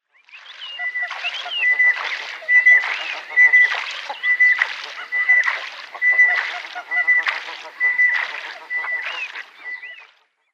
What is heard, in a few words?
White-headed Duck pair display